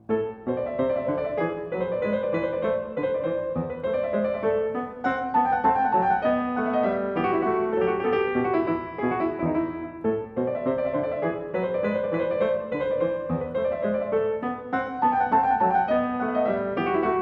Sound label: Aria variata alla maniera italiana, in A Minor Variatio II; Bach; Ashkenazy; BWV 989